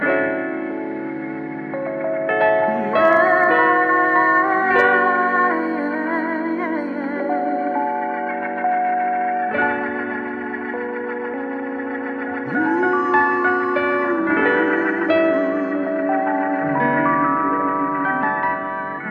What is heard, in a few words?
keys and voice yeah ah ah ha ooh hoo, Phonky loops